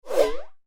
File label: Swish And Boing Combo Sound; Whoosh Sound Effects; accent sfx for whoosh, wherever swish, sweep and swoop sounds are required